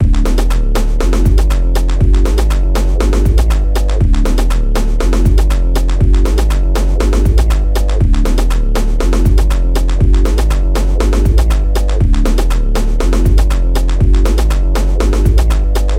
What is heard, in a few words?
syn beat